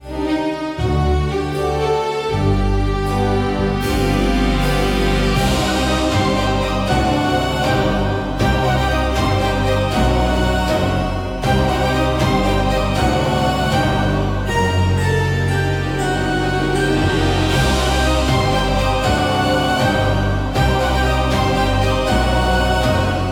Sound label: vampire ball